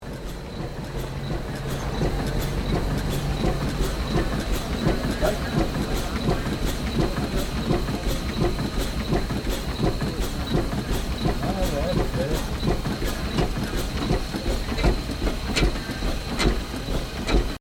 Tractor steam traction engine 1900 pistons operating; John Fowler